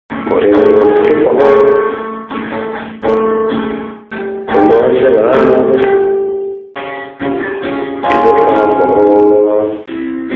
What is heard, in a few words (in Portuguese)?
vamos pro forro